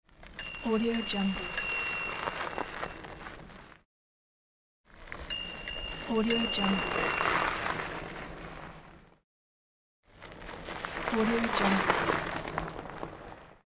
bike passing by

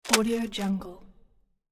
futuristic camera shutter click

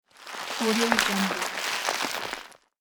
plant growing

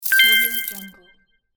win game coins

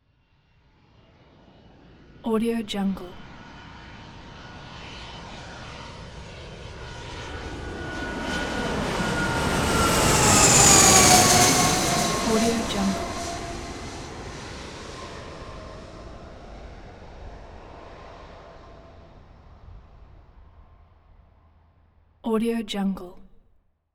jet pass by; blast drive 30; prosoundfx

